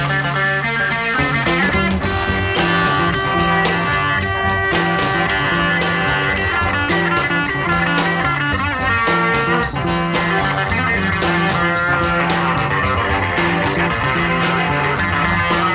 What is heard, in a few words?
solo